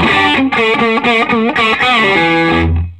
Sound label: SOLO E, Vintage Blues Guitar; USB Soundscan vol.22